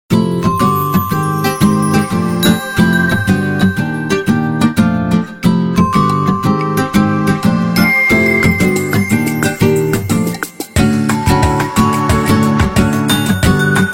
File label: Sweet jamming, Ringtones; 7373 Southeast Asia; Nokia